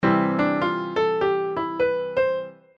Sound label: F on the beat; lydian